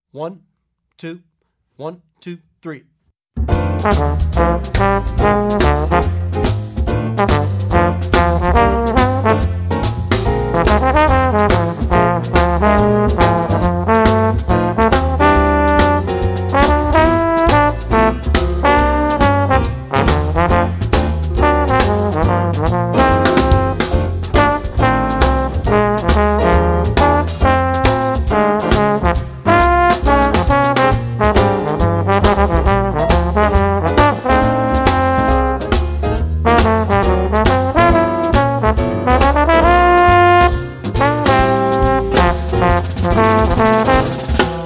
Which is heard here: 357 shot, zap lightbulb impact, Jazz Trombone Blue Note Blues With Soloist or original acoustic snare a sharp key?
Jazz Trombone Blue Note Blues With Soloist